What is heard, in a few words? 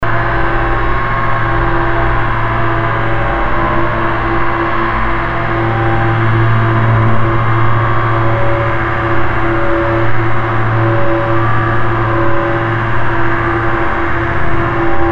Breathing Fetish